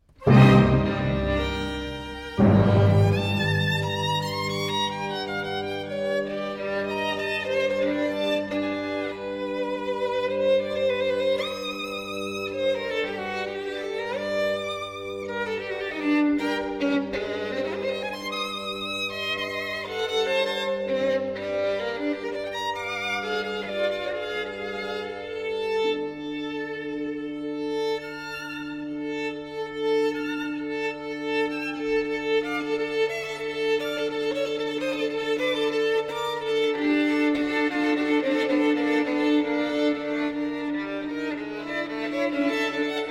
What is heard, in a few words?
Violin Concerto, Prelude, Largo - Allegro Cavalleresco